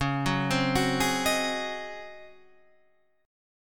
Db Major 7th Suspended 2nd Suspended 4th, Db, Guitar, Half Step Down